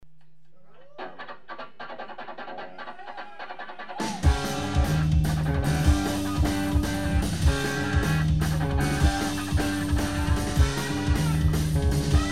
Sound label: Disco